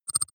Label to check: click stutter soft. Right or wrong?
Right